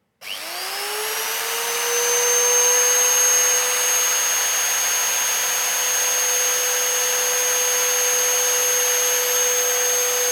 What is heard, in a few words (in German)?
Magnetbohrmaschine